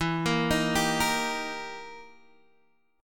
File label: E Minor Major 7th Flat 5th, Guitar, Open G; chord shape